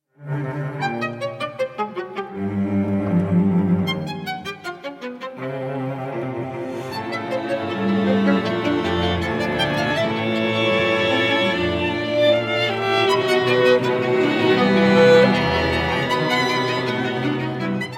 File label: scherzo from octet for strings in e flat major; op 20